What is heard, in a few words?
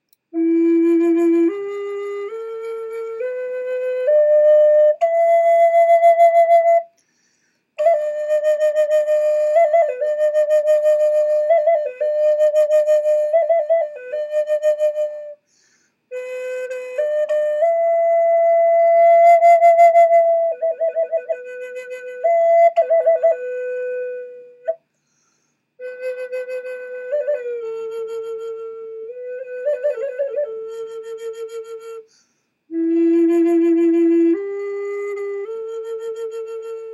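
natural, flute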